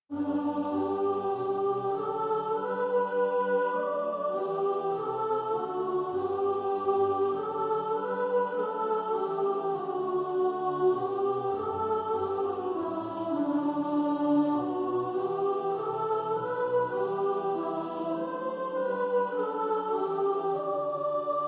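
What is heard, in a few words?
sop, practice music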